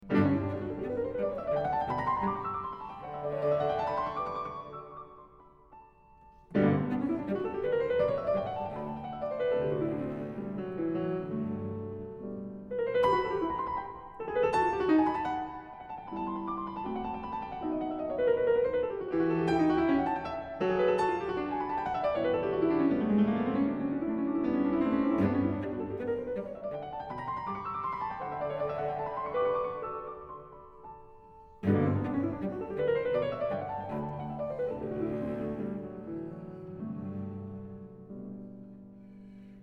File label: 12 Variations for Cello and Piano